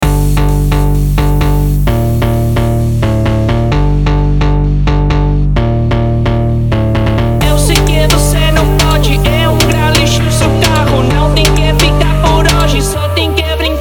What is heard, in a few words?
montagem phonk